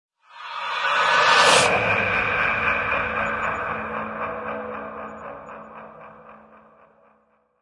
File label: Little Evil Scream; Ninja Tracks